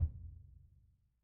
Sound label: Bass Drum hit; Membranophones; Struck Membranophones; Bass Drum 1